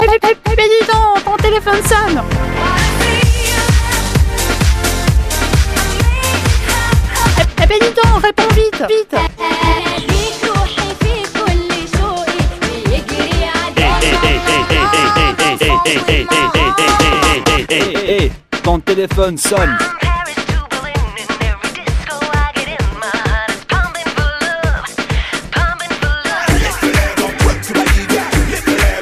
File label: Remix Phone